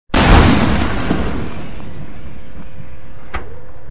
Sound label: hit; RS Games Sounds; Battleship